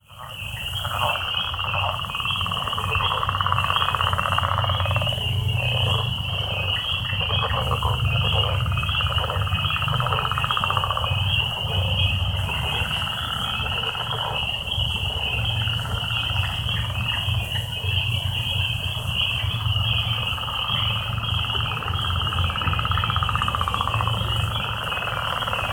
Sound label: frogs